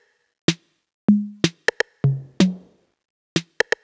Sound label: drum loop chit percussion 125, Classic Chicago House; Sample Magic; Drum Loops